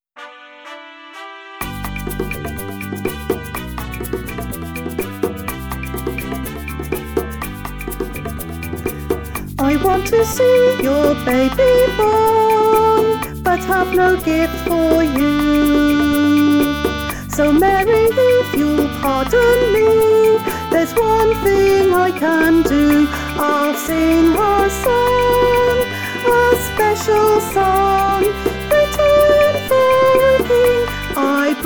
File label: vocal; mayors christmas carol music